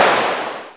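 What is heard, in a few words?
percussion clap, Analog Drums; Roland - Rhythm Section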